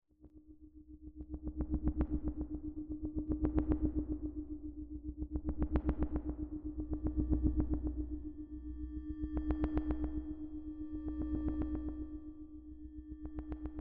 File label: el train mood; inFamous; PS3